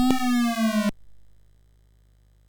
sid, MOS6581R4; Classic Concentration

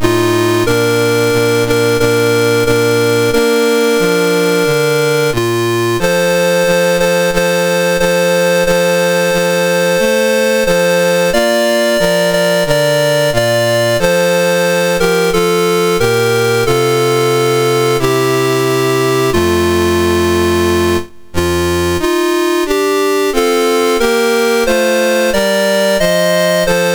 Liberia, National Anthems, MOS 6581

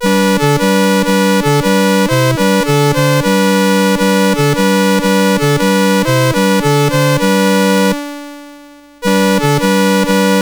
BASIC sid CSG8580R5; Crac Mur

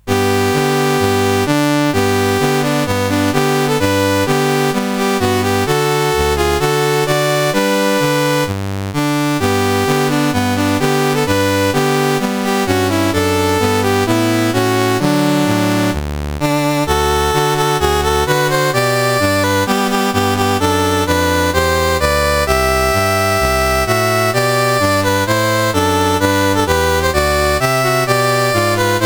Albania, National Anthems, CSG8580R5

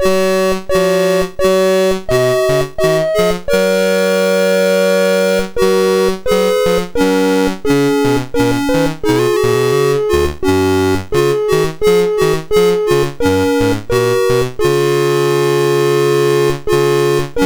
BASIC, MOS6581R2; C Prog Ref Guide example; Commodore

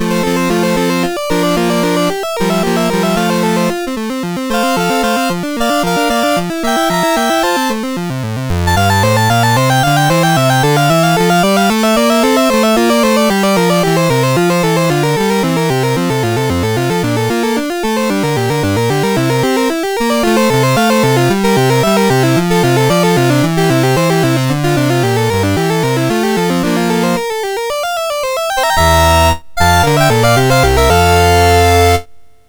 Prelude in F Major, MOS6581R4

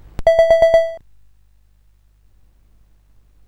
sid CSG8580R5; California Games

